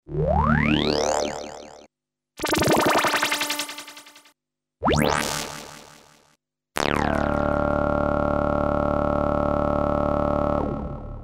syrinx; synton

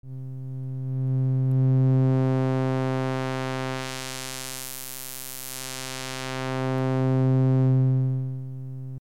bpf no reso, synthesizer basics

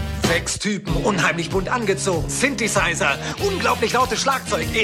synth; 6typen

